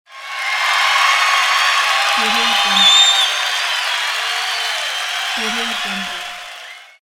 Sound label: crowd cheers short